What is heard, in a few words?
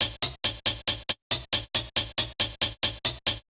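Big Beat Drumloops, hi-hat, left; USB Soundscan vol.25; AKAI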